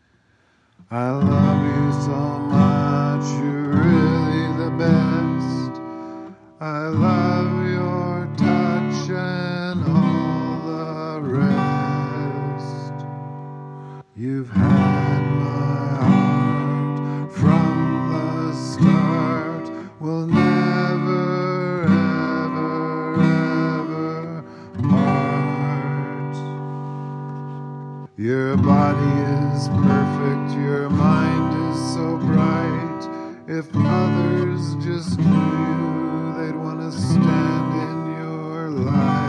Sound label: Eavesdropping